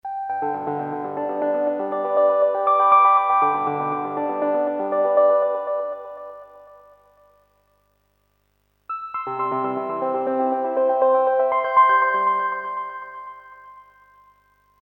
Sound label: ringtone